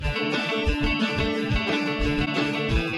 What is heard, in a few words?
Groove